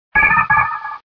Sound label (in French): Cri